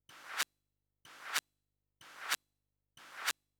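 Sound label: percussion, house, 125, drum; eJay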